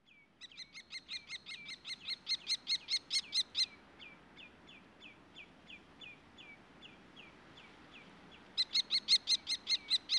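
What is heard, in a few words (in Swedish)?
Tornfalk, Falco tinnunculus - Tornfalk ovanför boplatsen (varnande rödbena); flera flygand; flera flygande falkar; Swedish Bird Songs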